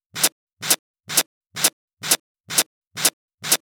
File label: Minimal Loops